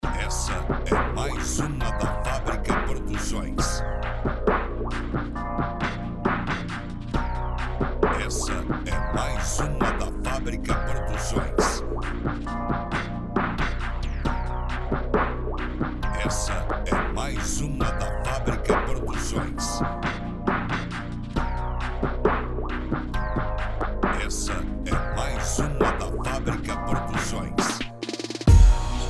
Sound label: beat trap